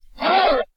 Monster Pain

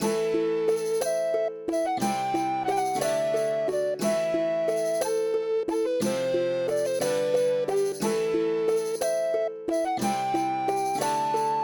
Medieval Fantasy Rpg Loop; Mix At 32 Secs To Extend Repeat

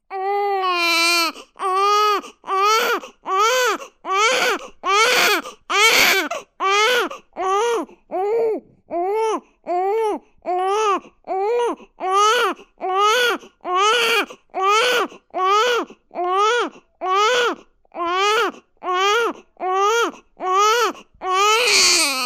4 Days Newborn Crying

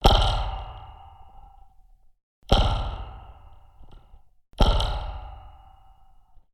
Large Spring Plucked

